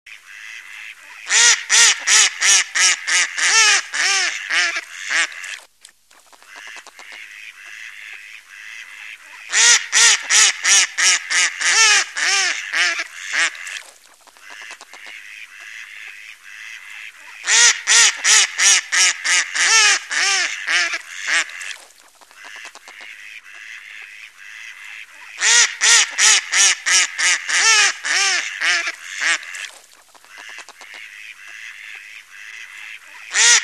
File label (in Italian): Germano